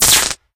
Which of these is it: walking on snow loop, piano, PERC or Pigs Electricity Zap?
Pigs Electricity Zap